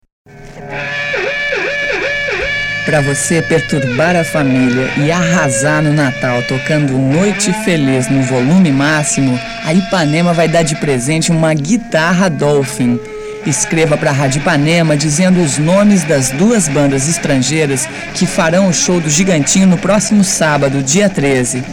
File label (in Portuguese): Natal Guitarra; Natal Guitarra Dolphin; Spots Especiais